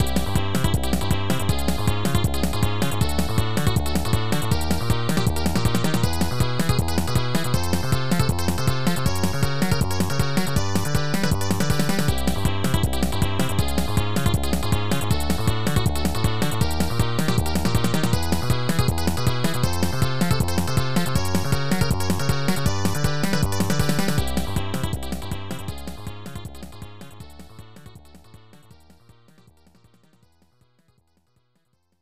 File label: Warning; Super Puyo Puyo 2 Remix